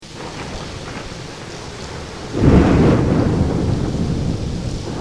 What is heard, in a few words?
thunder